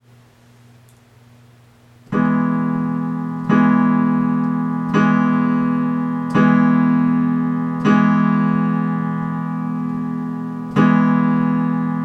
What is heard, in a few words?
chord old folks at home